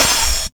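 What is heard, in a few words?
hihat open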